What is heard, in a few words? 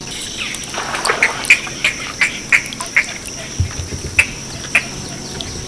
ank ank; National Marsh Bird